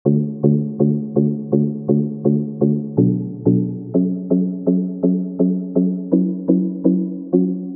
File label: Pluck